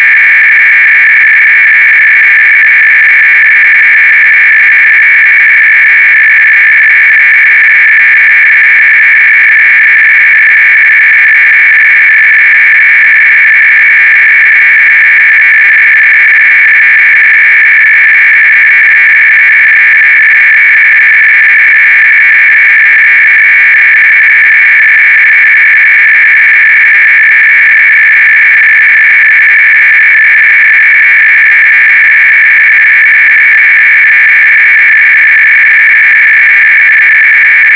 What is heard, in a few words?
sine